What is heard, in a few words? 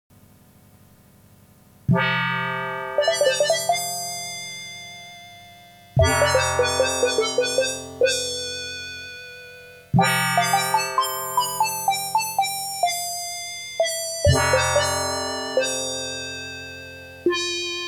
Yamaha PortaSound VSS-100; Musical Showcase